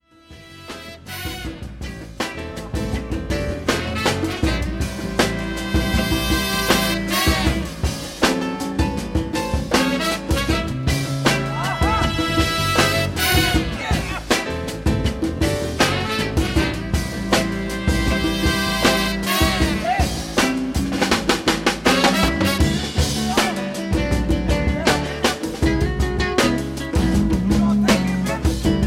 soul drums clappin

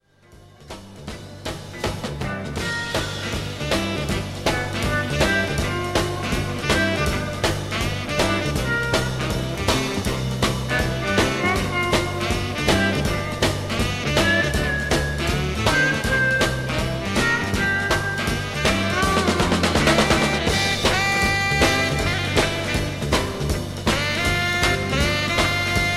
soul drums groove

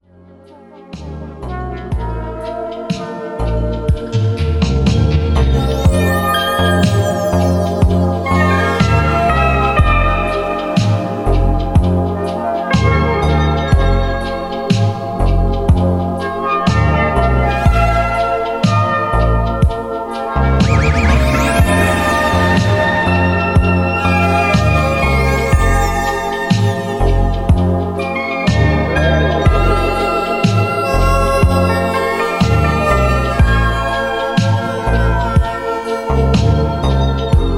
instrumentals

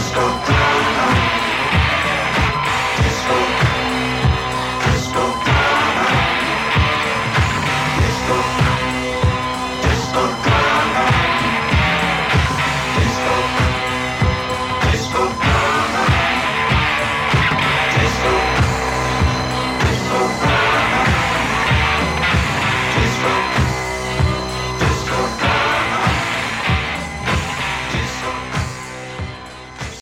disco drum

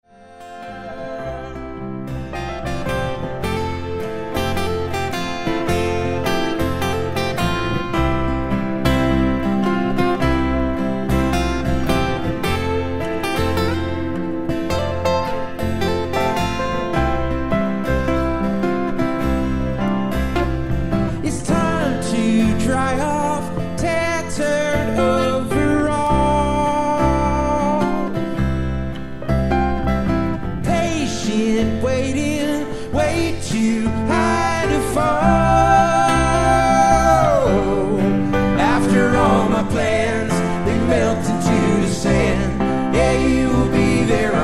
acoustic

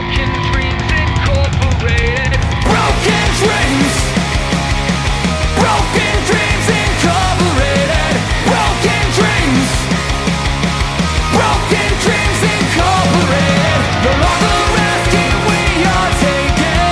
death metal

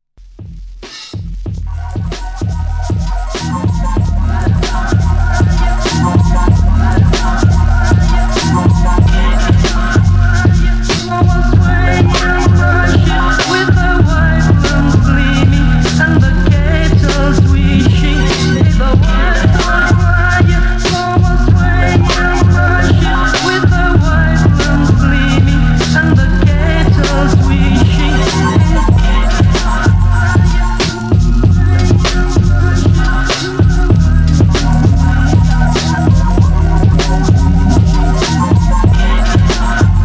instrumental

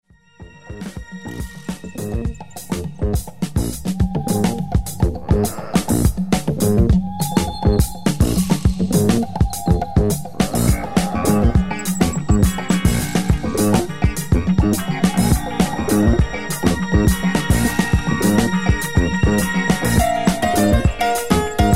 electronic jungle

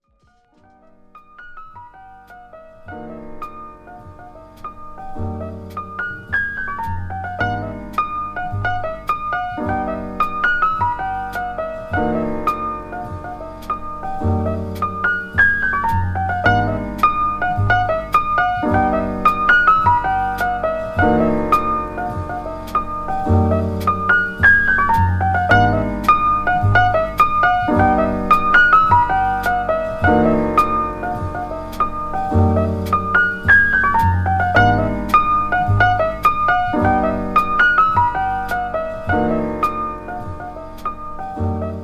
piano

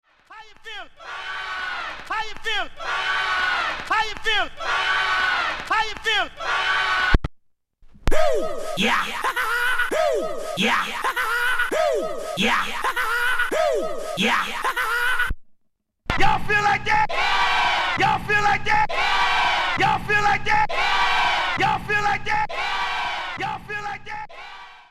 scratch samples

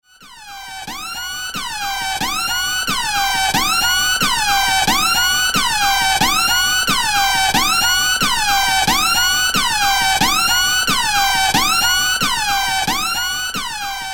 siren

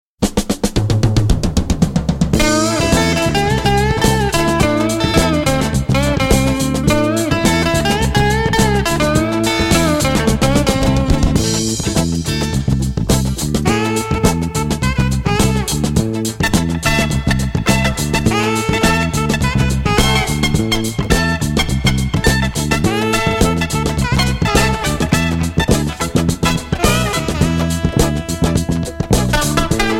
funky Korean soul

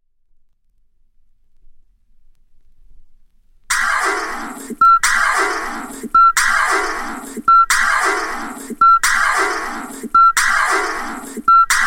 ahhhhh beep

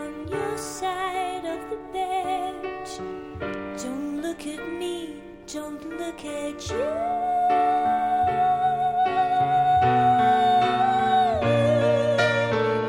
warm & soothing

